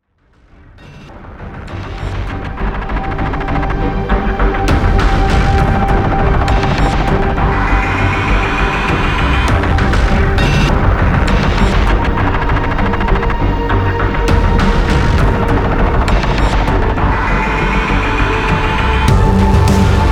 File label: Exploration Meteors